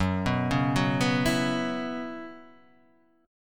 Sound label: Gb 7th Suspended 4th Gb down Guitar Half Step Down, chord